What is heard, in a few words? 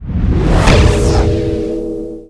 skill shot fire